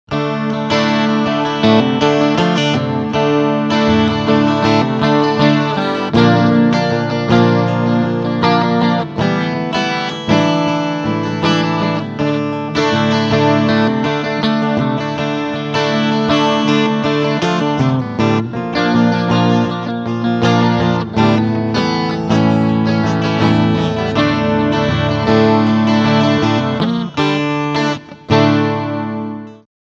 12 String Pick Strumm

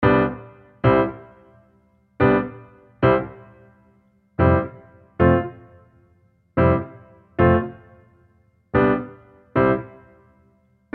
EZkeys Studio Grand Basic Rock Standard

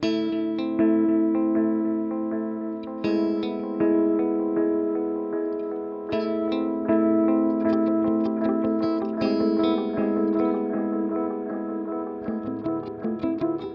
Chase Bliss Audio Thermae Step Trigger

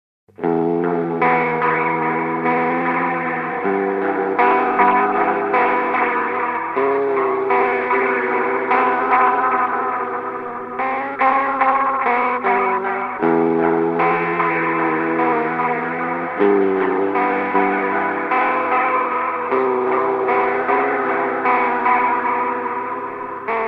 Delay Echo Cassette; Meris LVX Modular Delay System

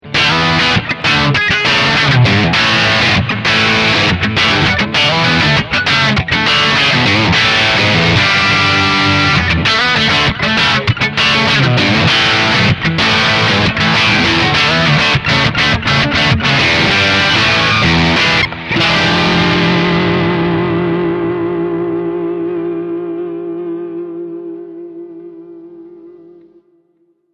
Funky Crunch

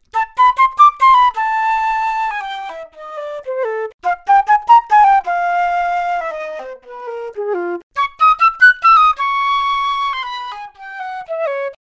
Flute

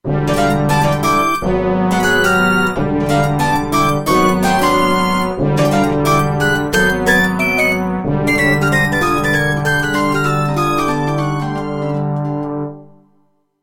Version E Rich Layer

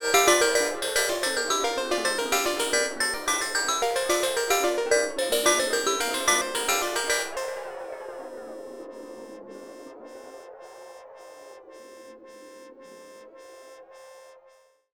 Crazy FMish Bells; Freds Lab Töörö

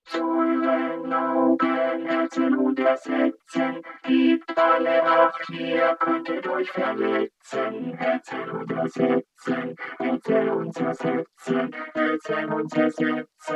Vocoder Ensemble; 1up Mikme VC340

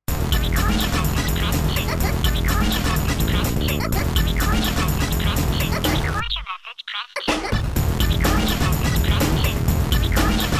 Electribe SX Big Beat

